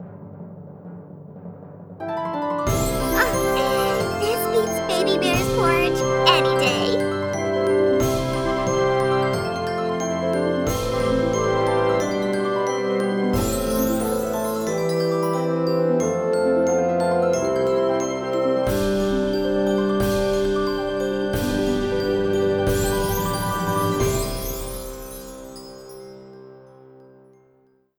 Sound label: Trophy Glocks; Shrek Smash n' Crash Racing; PS2